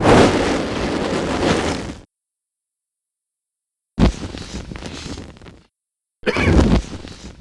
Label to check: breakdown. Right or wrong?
Right